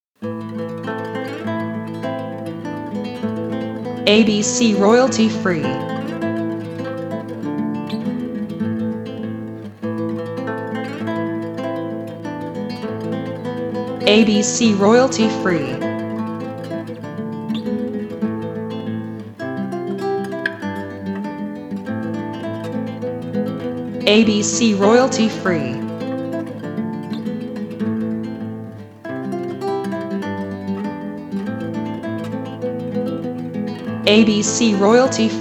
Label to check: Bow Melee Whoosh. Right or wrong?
Wrong